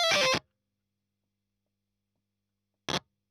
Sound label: EL Fuzz End